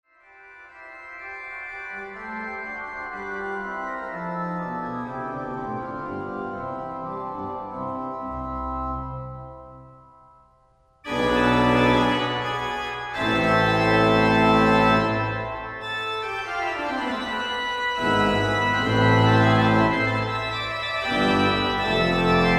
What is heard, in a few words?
orgue; Dietrich Buxtehude; Praeludium in C BuxWV 137